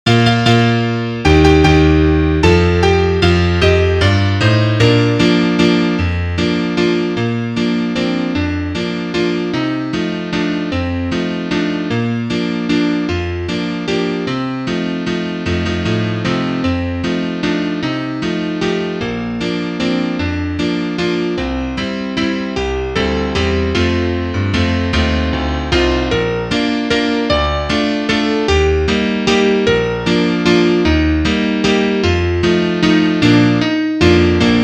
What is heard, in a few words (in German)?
Klavier Solo, trompete; Unterm Kirschbaum